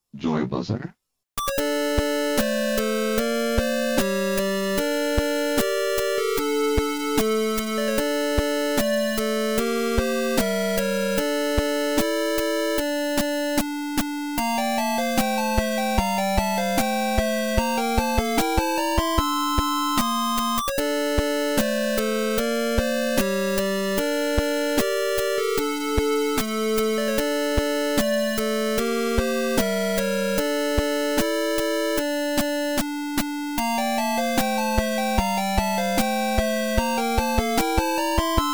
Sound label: church 8 bit mix